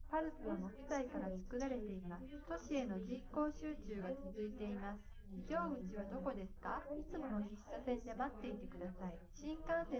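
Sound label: female, live recording 250ms 5cm; SiSEC11; underdetermined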